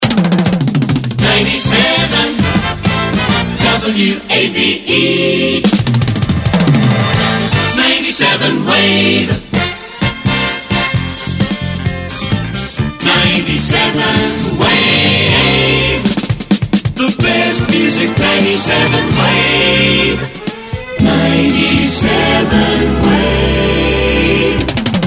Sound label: Jingles; WAVE